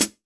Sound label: Jazz Pedal HiHat